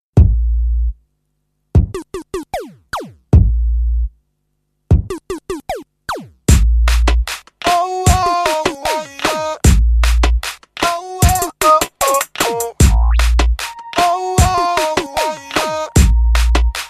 Awesome Mix Instrumental Ringtone; ringtones

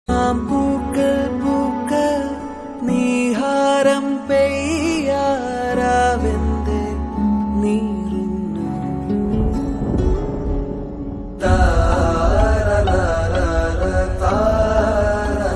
Malayalam Ringtone; Luca

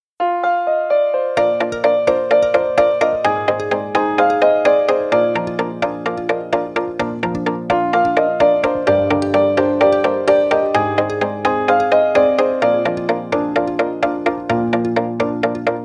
New iPhone Ringtone; ringtones

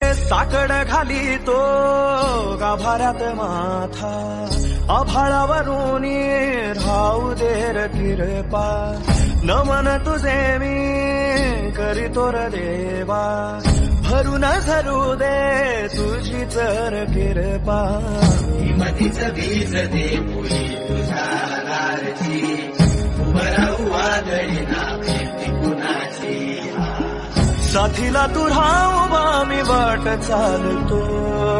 Bhakti Song Ringtone

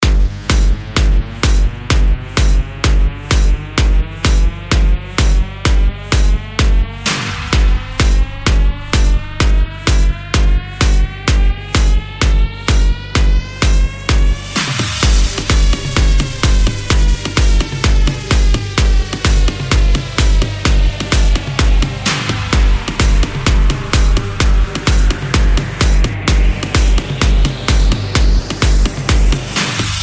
Bass Ringtone; ringtones

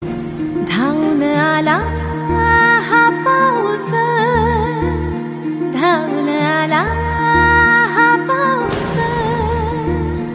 Marathi Ringtone

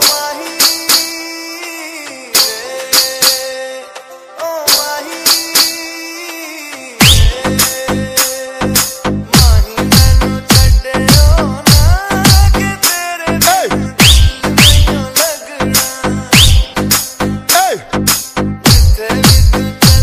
Dj Remix Ringtone

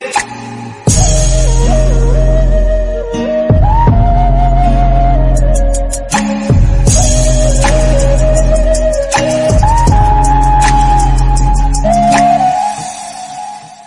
Instrumental Song

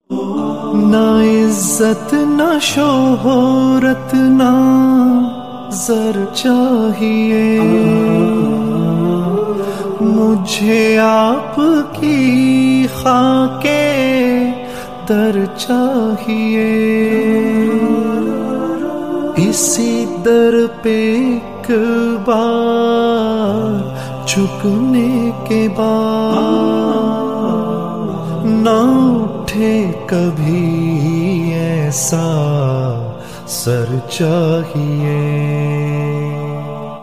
Naat Ringtone

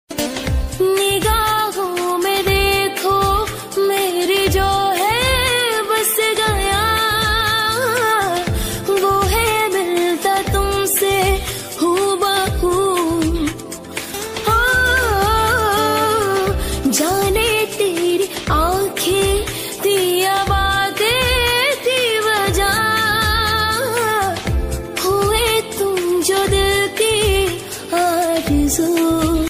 Female Ringtone

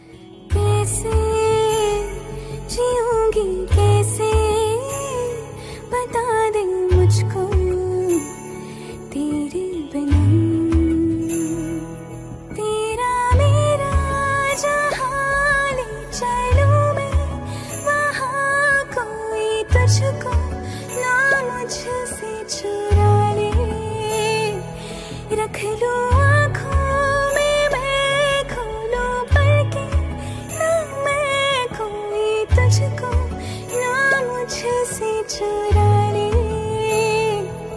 Female Version Song Ringtone

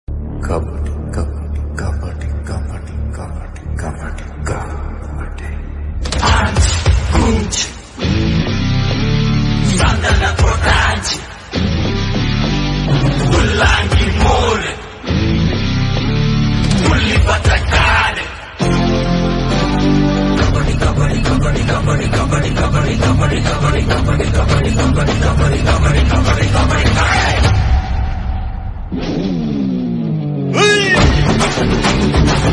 Song Ringtone; Master Movie

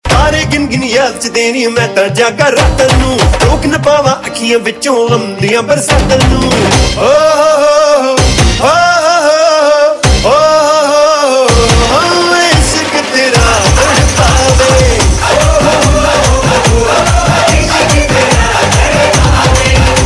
Remix Punjabi Ringtone; ringtones

